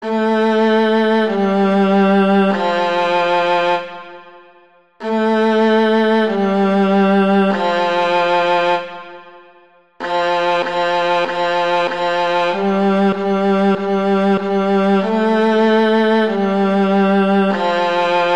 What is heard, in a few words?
Hot Cross Buns, viola solo